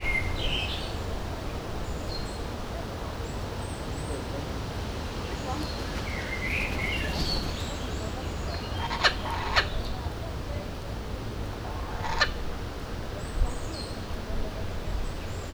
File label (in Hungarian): nagyon halkan szél, kookaburra kacagójancsi; allathangok; veszpremizoo 2013